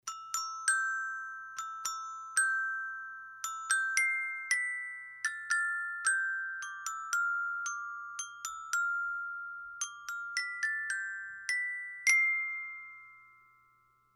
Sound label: Metallophone; Gute Nacht